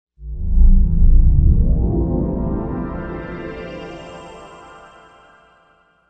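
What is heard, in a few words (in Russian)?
волшебный звук; Короткие звуки для монтажа — для transition, движения, смены кадра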